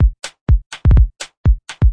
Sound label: DRUM LOOPS and BREAKS, 124 bpm; beyond life pippy plink